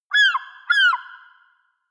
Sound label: seagull call squawk; natur tiere; voegel